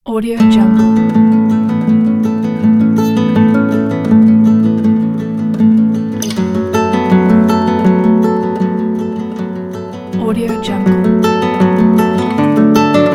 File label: acoustic guitar ambient